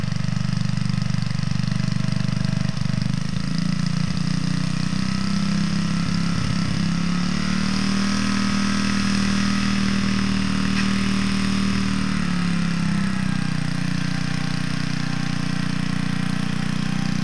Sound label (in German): boot